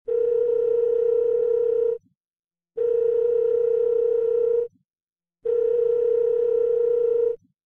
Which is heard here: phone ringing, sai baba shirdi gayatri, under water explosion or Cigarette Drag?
phone ringing